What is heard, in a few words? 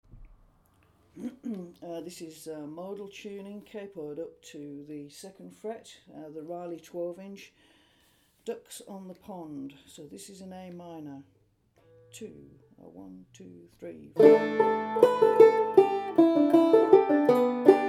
banjo; reilly 12inch; ducks on the pond